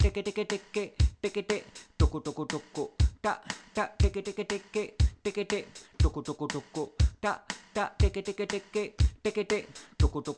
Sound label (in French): djembé